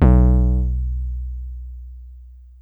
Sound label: Synth, Classic DX tone